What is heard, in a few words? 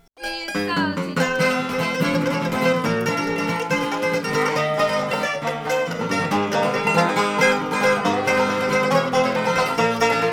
Blues